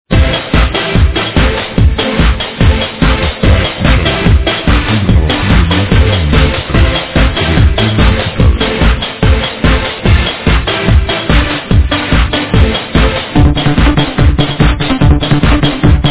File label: disco; dance